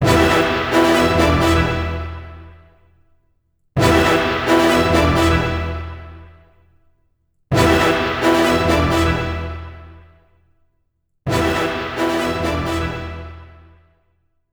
Gothic Stinger; orcs must die gamerip

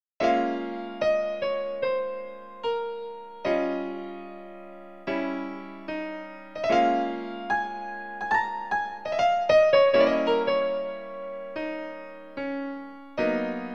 Piano Versio; Art of Fighting Anthology Arrange Sound Trax

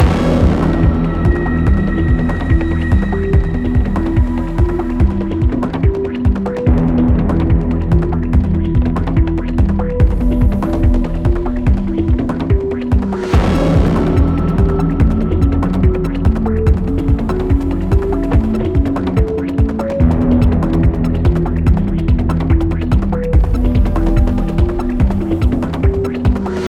rocket tension loop

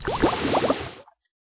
Soak

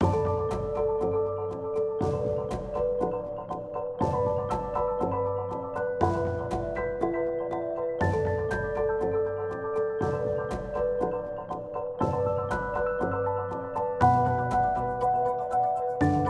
Chase Middle Loop; Never Alone

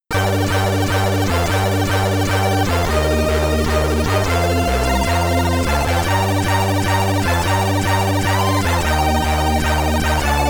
Ending, SID Stereo; Navy Seals; commodore 64

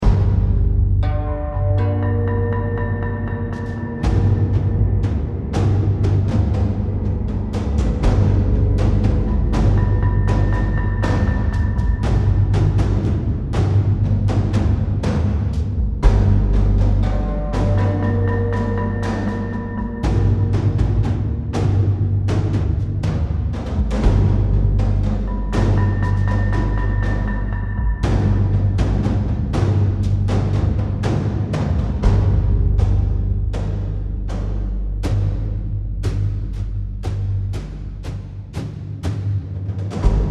Tension Combat Alternate Mono

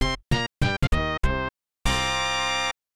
Intermission Jingle; bs sousa sentai wappers; snes

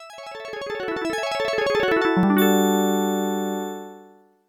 Logo (Sega Genesis Version); Capcom

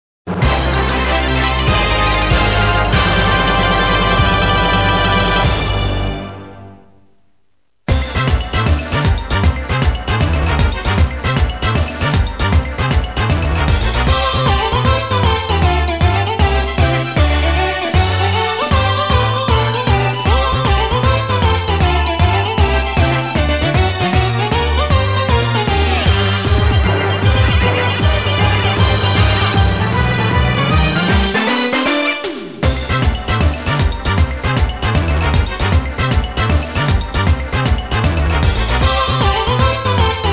Balloon March; Konami Shooting Collection; Stage 4 Boss BGM

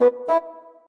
Maximize; daVinci

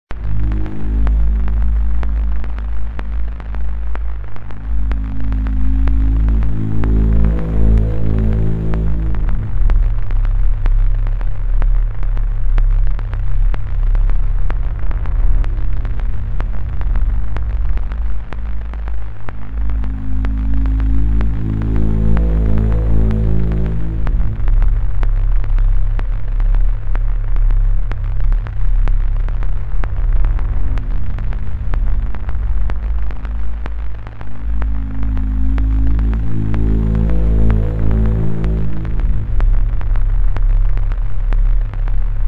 Dark Ambience; Avocuddle

